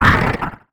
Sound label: damage; Barotrauma; Barotraumatic; NightmareCreatures; StationCreatures; Husksprinterhumanold